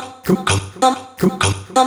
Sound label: House Loops, Vocal Loops House 128BPM